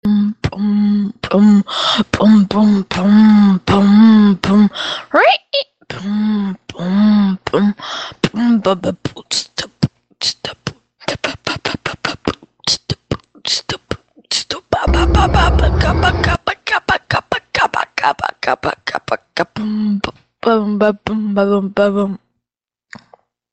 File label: beatbox